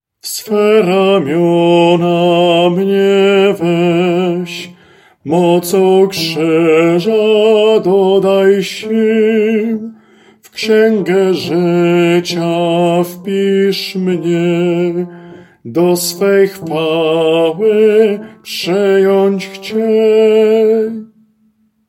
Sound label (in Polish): BAS